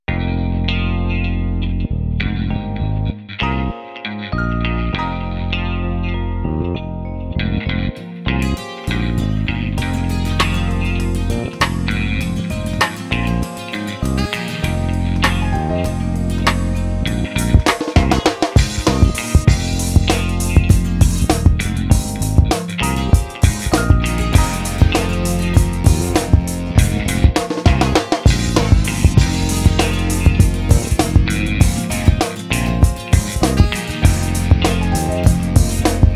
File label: game day